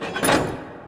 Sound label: lever; Golden Crayon Sounds